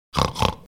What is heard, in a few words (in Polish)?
powiadomienie